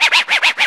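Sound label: scratch L, vinyl FX; E-Lab; X Static Goldmine 2; akai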